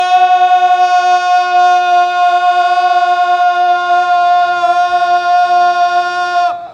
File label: aaaaaahhhh